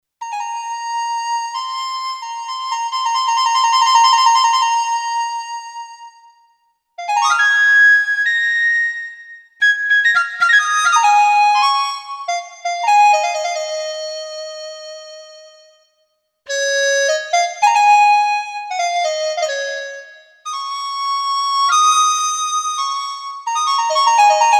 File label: Yamaha P 145 Di Zi Flute